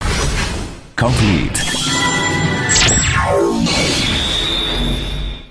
henshin sound; muez

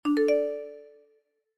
notification iphine

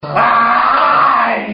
aaaaaai

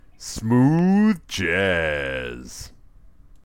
smooth jazz

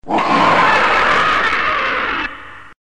roar; vakishim; ultraman mebius

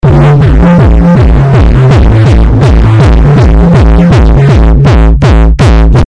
german disco house

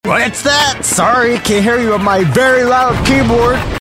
very loud keyboard

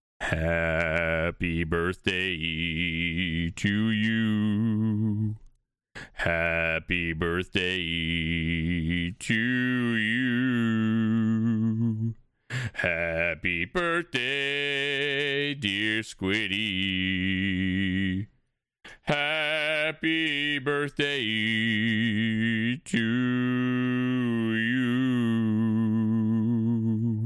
birthday song

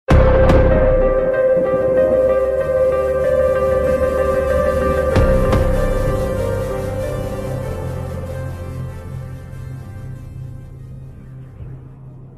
music losing end

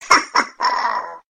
hohoho; talking tom

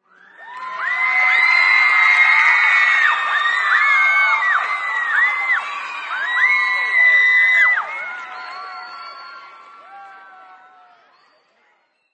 cheering indoor

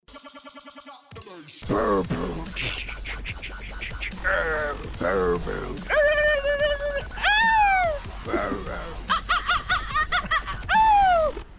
dubstep

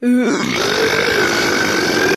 ultra burp

